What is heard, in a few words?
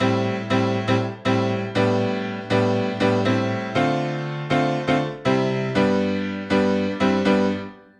Chord Piano Am, Nu Disco, 120bpm; Zero-G; Extra Chord Progs